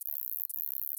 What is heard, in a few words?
song, katydids; neduba; macneilli